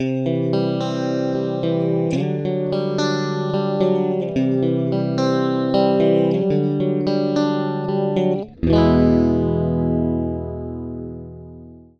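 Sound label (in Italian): chorus phaser, Esempio catena chorus e phaser, chitarra; pedaliera con chorus, flanger e phaser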